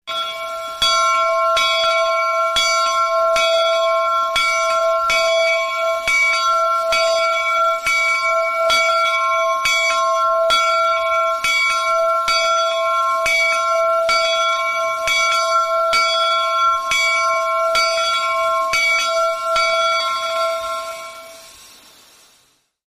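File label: Steam Train Departure, crossing bells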